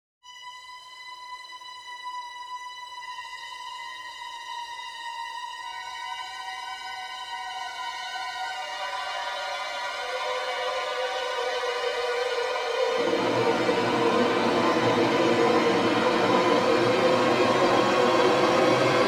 Horror Movie Strings; Mcmorrise

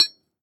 Metal perc

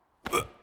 Damage

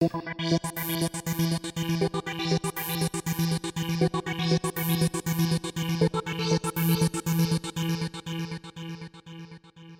Acid line long

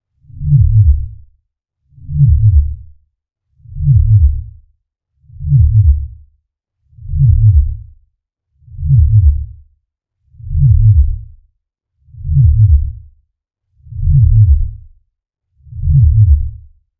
Synthetic Heart

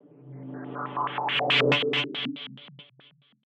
Swell

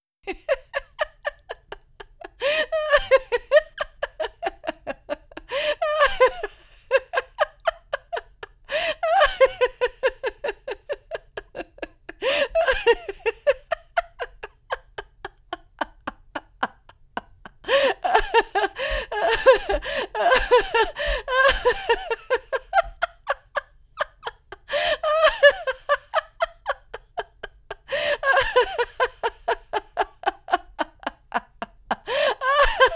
Laugh

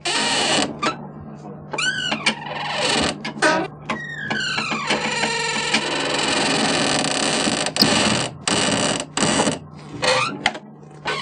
Crunching hinge

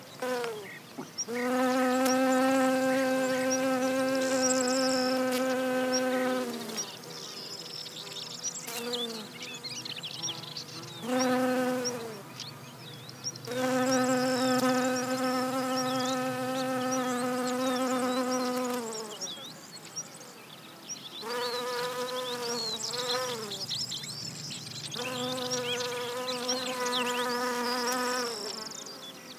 Apis Genista

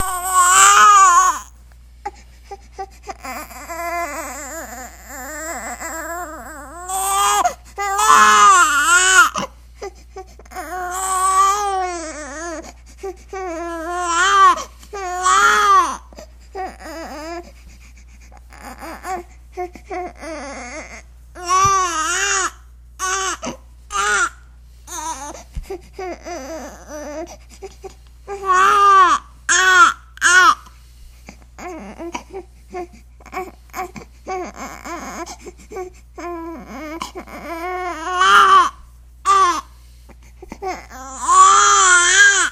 Baby crying

